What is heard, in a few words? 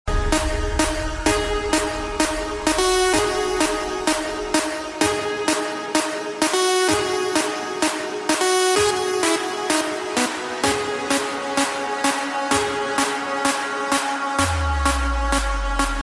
hip hop